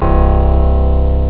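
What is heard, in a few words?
Rich piano; jazz jackrabbit 1; M8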